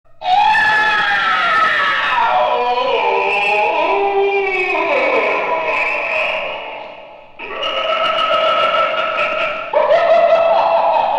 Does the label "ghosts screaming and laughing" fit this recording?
Yes